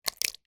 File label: horror guts bloody splat squelch in plastic bowl